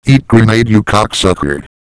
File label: throw; svencoop; cracklife; hgrunt